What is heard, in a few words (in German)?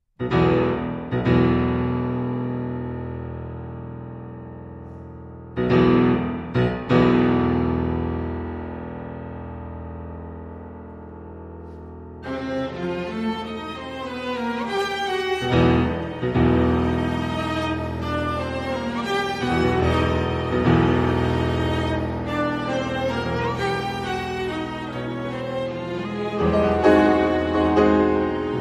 klavierquintett; 1. satz